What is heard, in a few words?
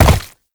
land flesh; Movement; Warframe; Uriel